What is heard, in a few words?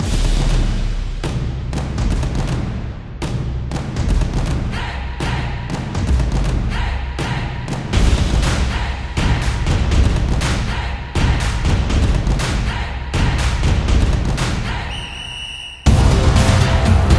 Game Winner, football music